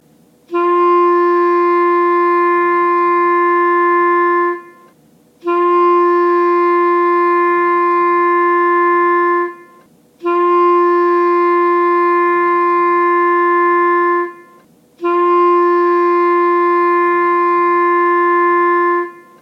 clarinet g

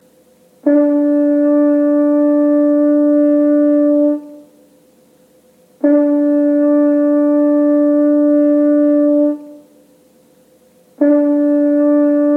french horn a

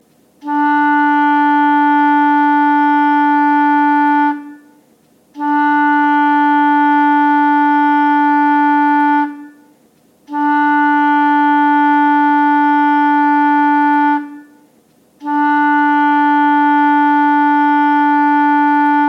clarinet e

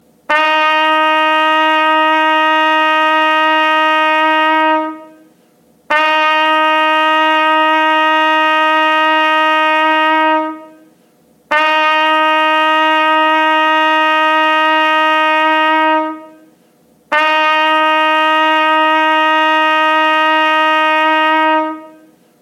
trumpet f

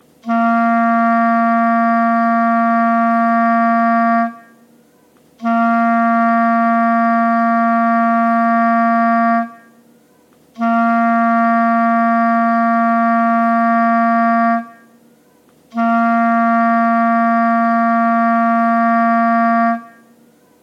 clarinet c

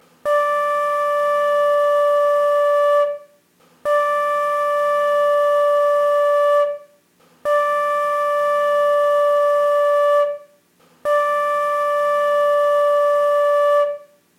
flute d

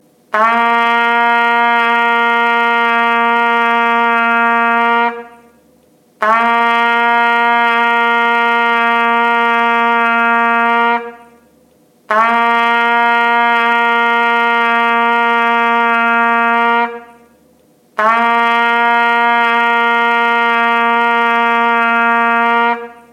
trumpet c

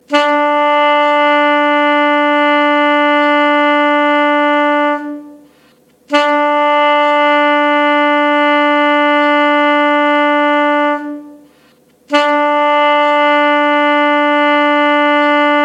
alto sax b

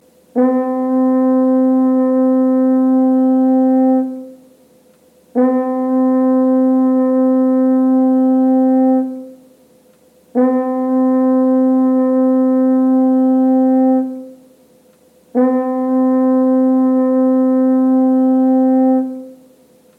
french horn g

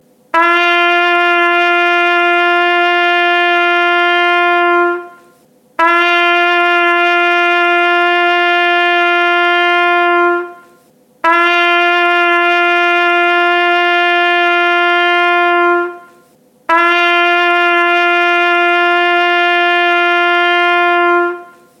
trumpet g

